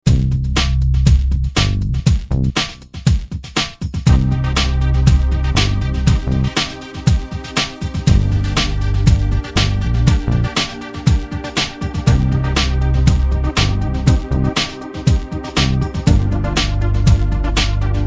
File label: Beat Boy, KORG